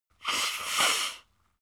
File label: nose blow; soundpool